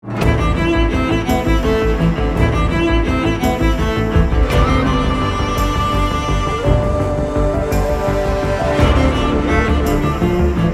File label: cello